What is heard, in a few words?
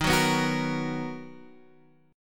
B Major 7th Eb